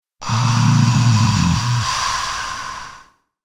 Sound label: Zombie